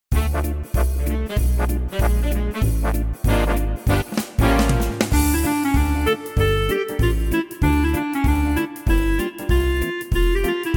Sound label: Quickstep